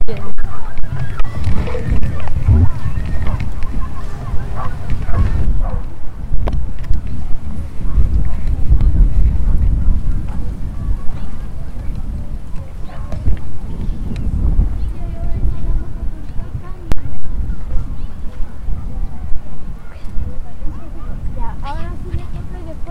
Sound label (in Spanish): Sirena; pichidegua cerro; mapa sonoro